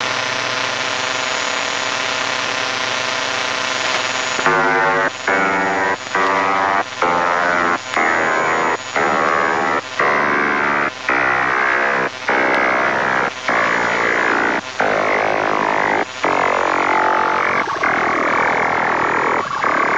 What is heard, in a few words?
Descending Jammer 7969khz USB